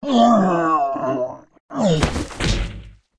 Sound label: ogre dead, monster